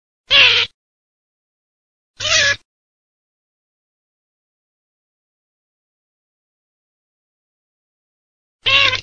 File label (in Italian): MARZAIOLA FEMMINA